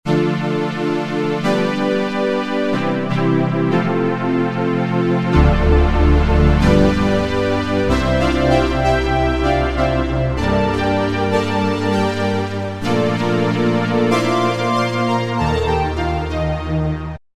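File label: Phatty Strings, Roland V-Synth; Factory Patches; 241 PhattyStrngs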